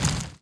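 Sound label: droideka stop start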